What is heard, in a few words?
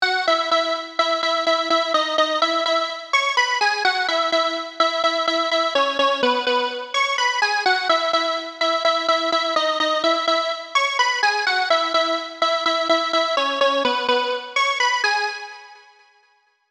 Lead; PatchFactory